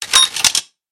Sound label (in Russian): zvuki mashiny vremeni ili teleporta